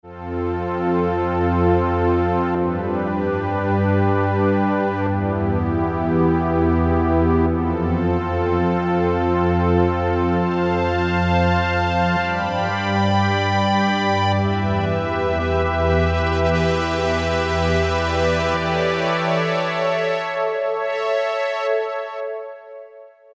Pad, Roland JP-8000; Jupiter Pad; Factory Patches

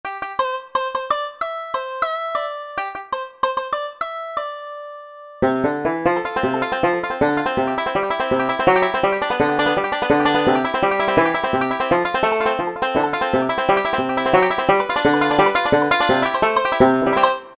Banjo, Korg T3

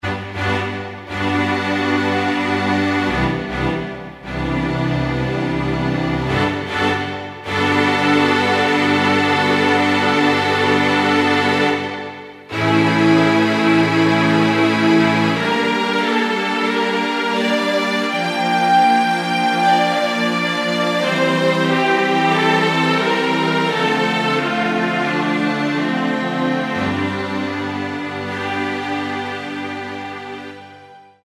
STRING HALL, Kurzweil K2000; Kurzweil K2000 Value Pack; Xtra Programs; Jord Vox

